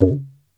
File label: gumki, mrid